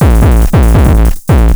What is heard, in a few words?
PW 909 140, Hard & Loud Techno; USB Soundscan vol.01; AKAI